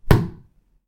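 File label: hit wooden pillar; knocking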